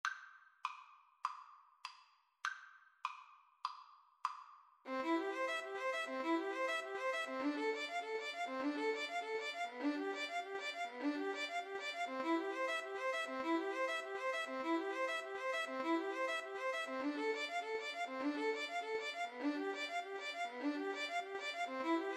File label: ave maria, Violin Cello Duet; gounod